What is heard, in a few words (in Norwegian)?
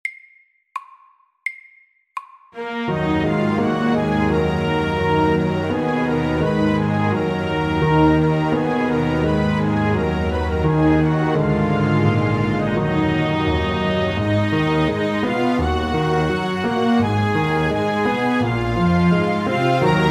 Gammel fransk melodi sakte